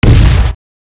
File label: boss boom